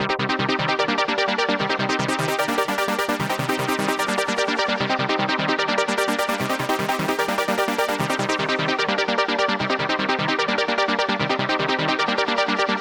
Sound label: FILTER; FILTER STYX; Masterbits - Tekkno Trax; SQ LOOP 150